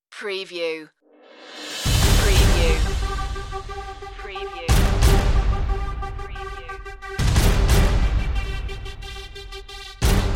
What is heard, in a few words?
epic title underscore loop intro